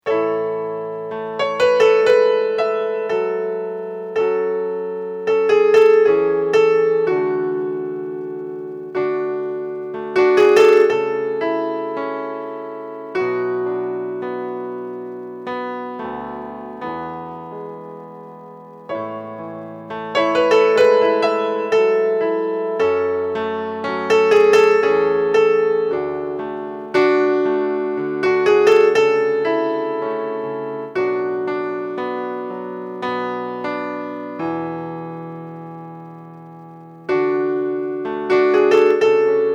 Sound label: Fall BACKING